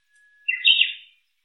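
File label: uguisu, Japanese bush warbler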